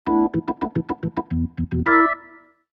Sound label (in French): exemple organ; physical music; time freezer